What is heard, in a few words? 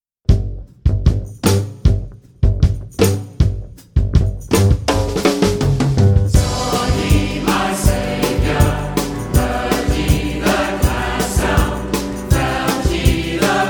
communion hymn